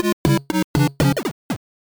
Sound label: Lose; WarioWare D.I.Y.; Super 9-Volt